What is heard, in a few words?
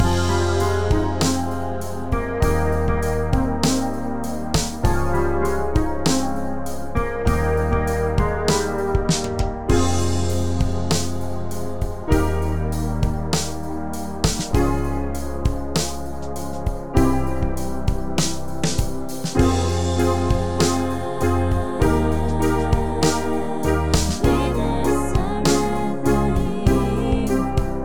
Instrumental; Deuteronomy 8 Song; sukkot 2026